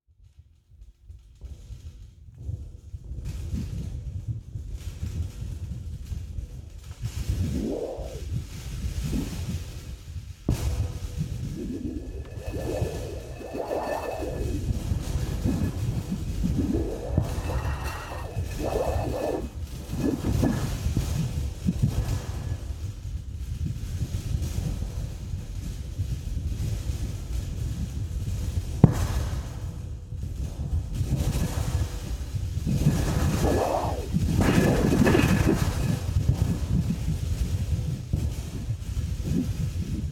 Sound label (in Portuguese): PLACA DE METAL